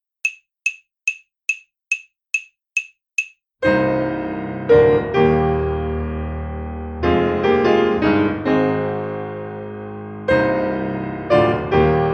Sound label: Metal Cello Rock